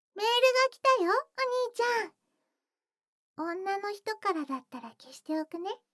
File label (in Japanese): メール; 全肯定ロリママのあまあまでえっちな添い寝; いもおかASMR